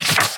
Tooltip; Kilta

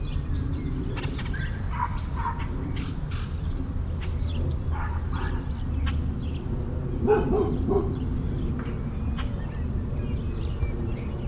Suburban morning dogs birds insects jets; urban and suburban; boom library

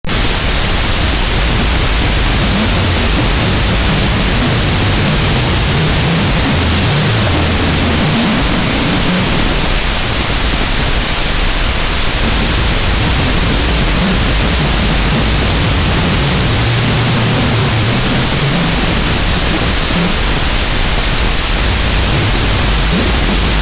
Scuba Breathing; 1001 Sound Effects; Foley

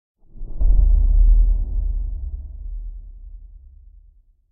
Impact Low Seep Sub Danger Hit - Reverberant; Hollywood Edge; sound designer's toolkit v2

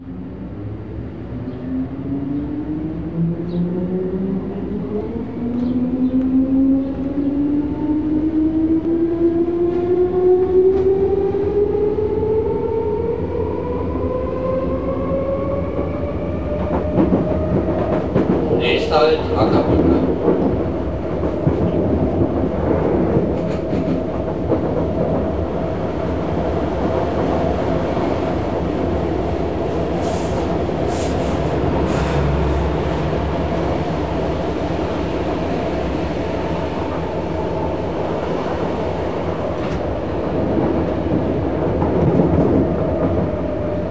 train interior; best service Blue Box

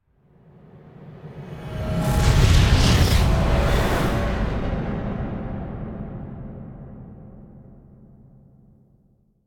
Whoosh Into Multi Fire Impacts Distant Heavy; Hollywood Edge; sound designer's toolkit v2

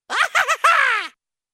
Funny Crazy Man Laughs, Cartoon; Hollywood Edge; Cartoon Trax

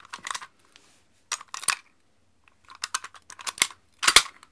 Gun Handling Weapon, Weapons Foley; SFX Kit

